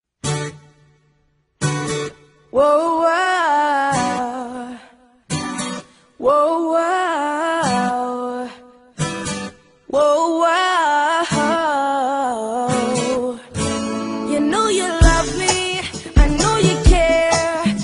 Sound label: baby acoustic